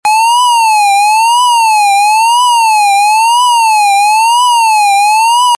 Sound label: tone